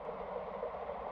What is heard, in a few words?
reel Spin; netent; luckyangler